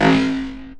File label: oldschool jungatone; Basses